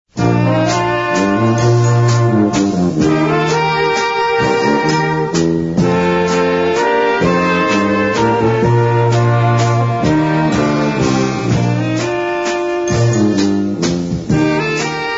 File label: waltz